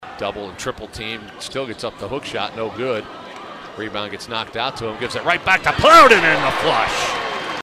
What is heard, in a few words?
slam; Plowden SLAM!; TU; 1st Half; Bowling Green State University Athletics; Tiffin; radio calls